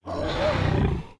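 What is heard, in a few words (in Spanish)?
CERDO INHALAR, pig inhaling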